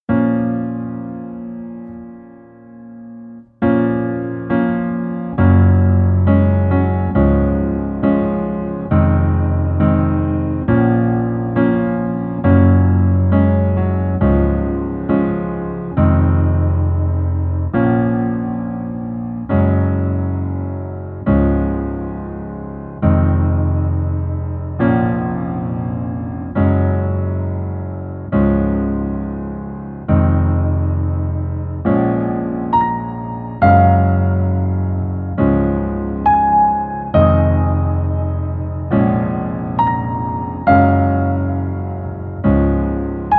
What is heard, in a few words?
Piano ver